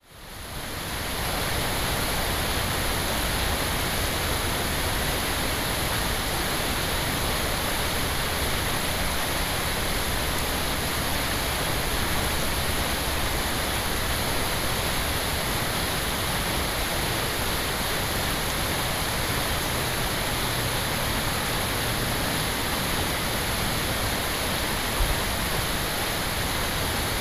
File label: pond, sergiyev posad; kelarsky pond